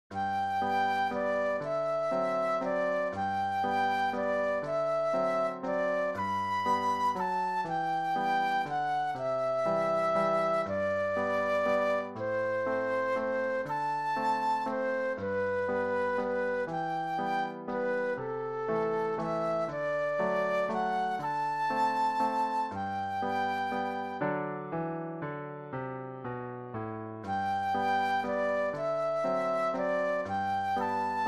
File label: Flute Solo